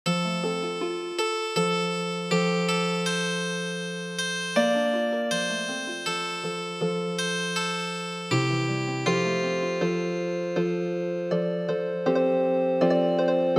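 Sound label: Cradle Song Healing Harp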